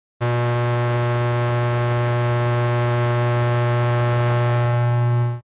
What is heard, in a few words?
Electrical Hum w Buzz; Electronic Sci-fi